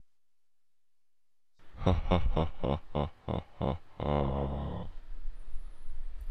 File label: Deep Laugh